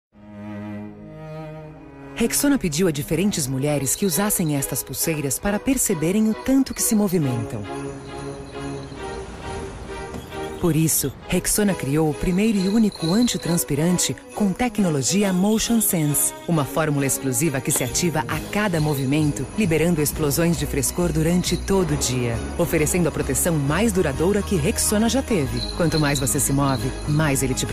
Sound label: Jingle Bells Women; Rexona